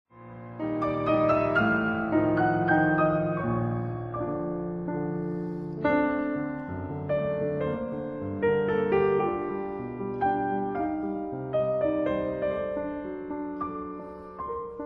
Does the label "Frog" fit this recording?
No